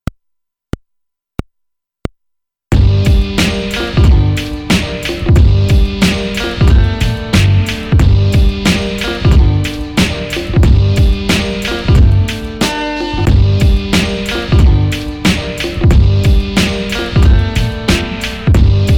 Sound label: Beat